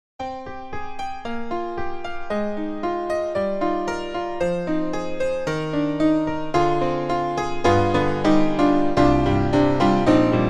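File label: Piano Shimmering Steinway; IK Multimedia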